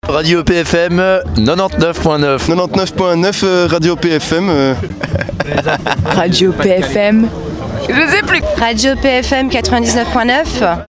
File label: jingle